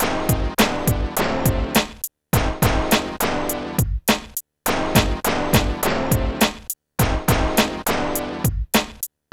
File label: PHATT BEA, Hip Hop; E-MU Formula 4000 Series Vol. 1; Hip Hop Nation